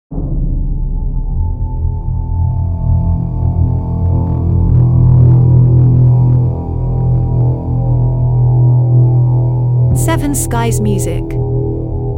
Dark Ambience

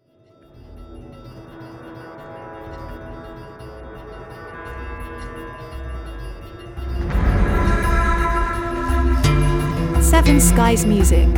Menacing guitar

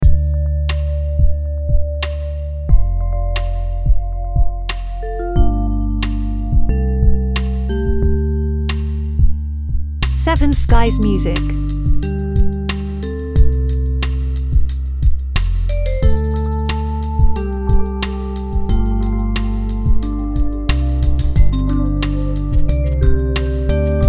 easy listenning - jinlge

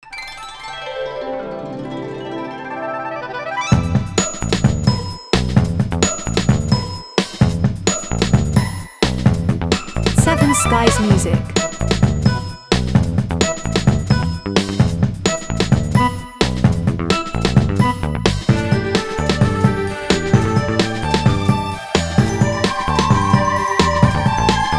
Jazz orchestral 70s Good Mood

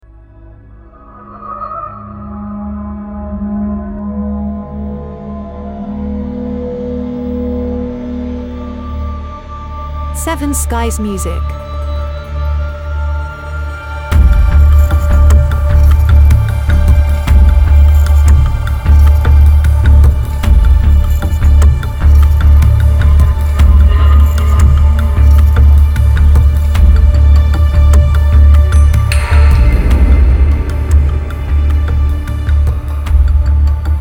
Ambient Suspense Alone in the Dark

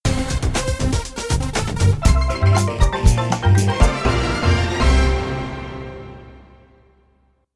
Quirky Stinger

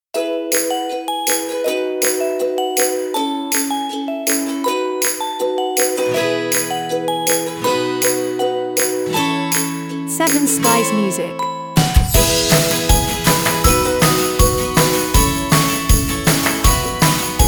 Today Is A Gift, instrumental

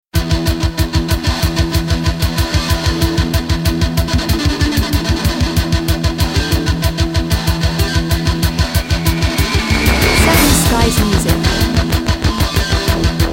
Stress Industrial

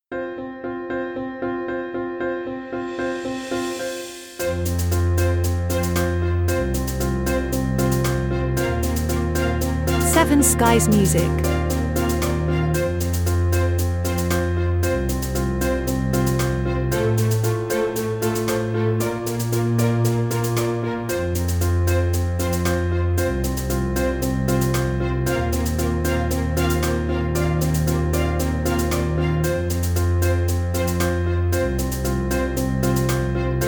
Corporate Mission

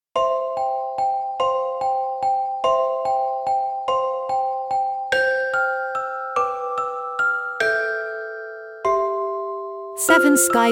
Little Princess Waltz Music Box Version

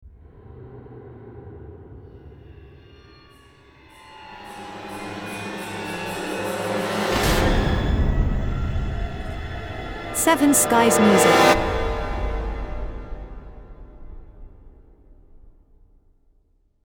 Scary sting

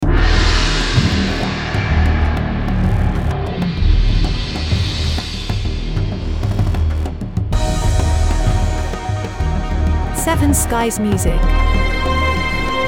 Orchestral Electro Powerful tense heroic

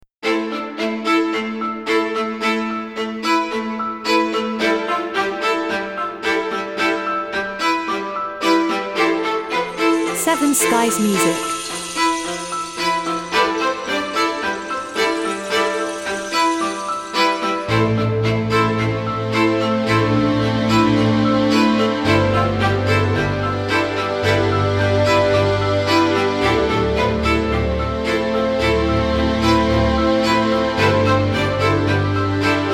Staccato Strings Theme

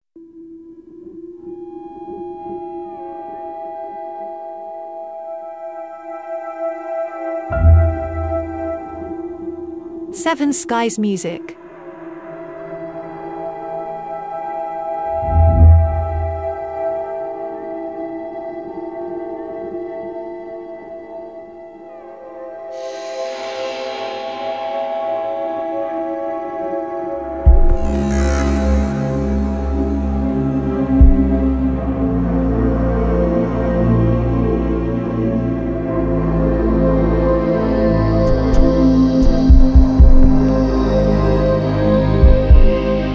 Ambient Dark Film Series

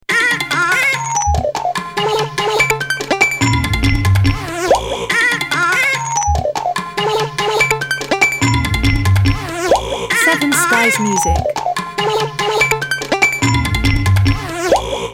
Fun time loop

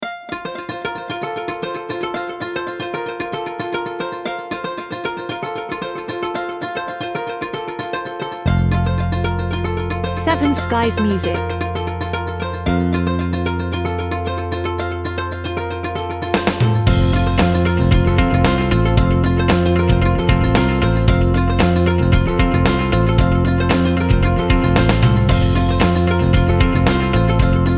Corporate Inspiring

